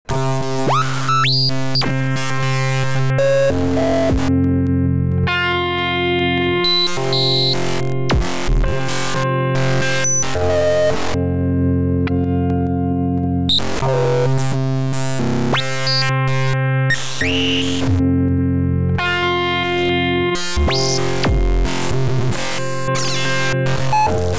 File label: Gtr drunk step filter